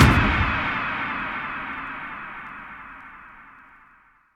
FX Downlifter

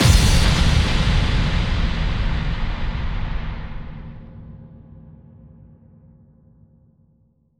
Bassdrums FX, FX Kicks; Vengeance Samples

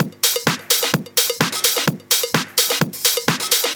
House Loop, 128 BPM; Vengeance Samples

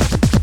Vinyl FX and Scratches, FX Scratch

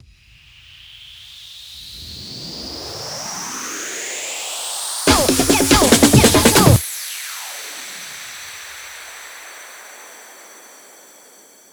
140BPM 4Bars, HandsUp & Trance Fills; Party People Medium; Vengeance Samples

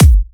128BPM Big Floor Groove Kick; Vengeance Samples

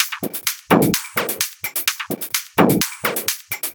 Minimal Loops

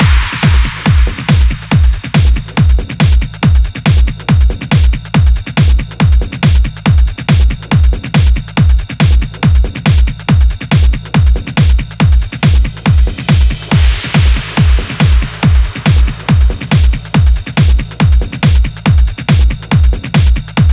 Drum Mix 140BPM 16 bars; D minor; Drums & Perc; Vengeance Samples

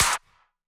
Clap; Vengeance Samples

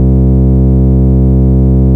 Ultimate Bass Root C; Vengeance Samples

